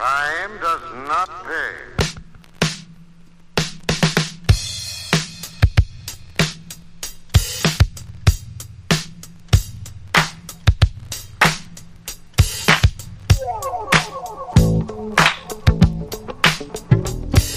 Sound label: instrumental beats